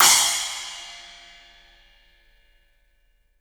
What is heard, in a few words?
CYMBALS; 3D Sound; 3D Drums